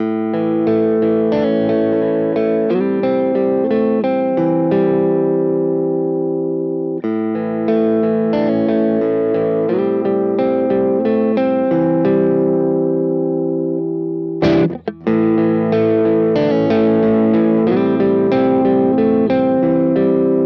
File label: Neck Clean; Cort KX-500